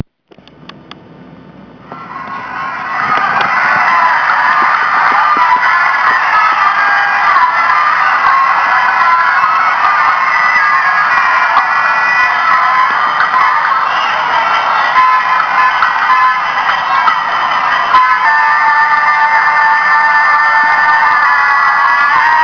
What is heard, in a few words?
jaipur parade